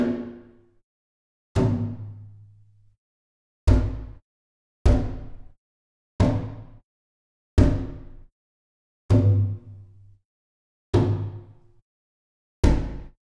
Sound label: Gamelan; Propeller Island - Complete Gamelan